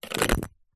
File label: ping pong balls in plastic tub tip out onto carpet